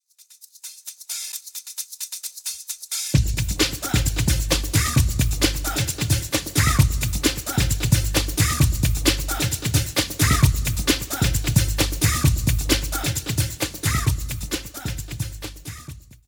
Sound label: Beat